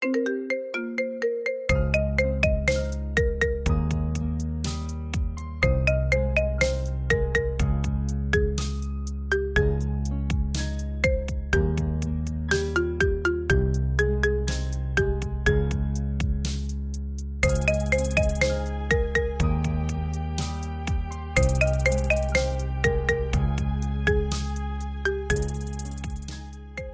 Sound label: Marimba Remix